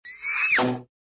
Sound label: fweet & fblonk; cartoon; hannah barbera cartoon sound fx